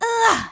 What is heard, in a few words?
"uh"; pauline; SM64 CO-OP